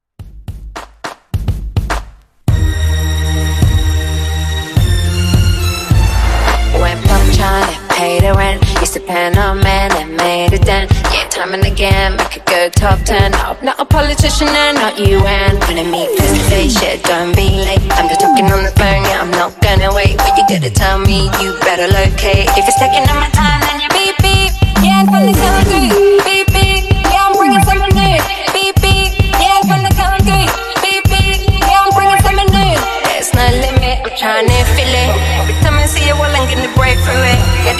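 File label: Top40s Mashup Acapella Instrumental 105 BPM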